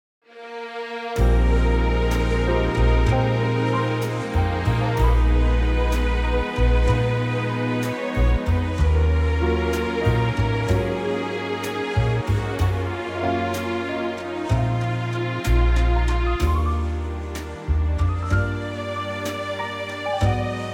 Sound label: orchestral Eb